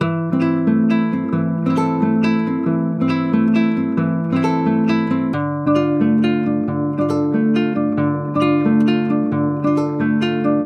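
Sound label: 6-8 arpeggio nylon; Guitar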